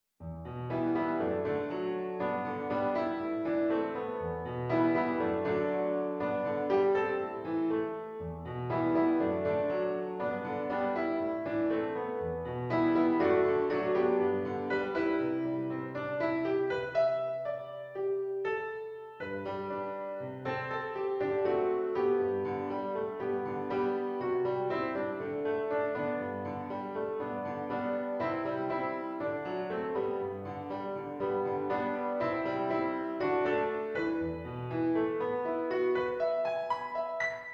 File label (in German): Klaviersatz voller; Cramer